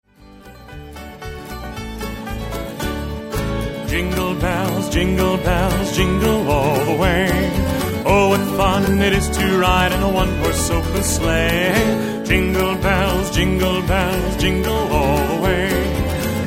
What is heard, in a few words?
Jingle Bells; The White Snows of Winter